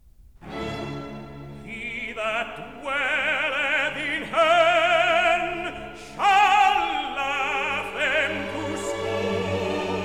Messiah: Recite: He that dewelleth in heaven (Tenor)